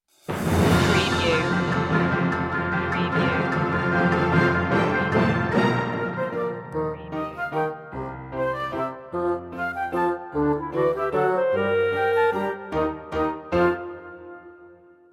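we wish you a merry christmas orch loop intro, Christmas Traditional We Wish You a Merry Christmas Orchestral; media music now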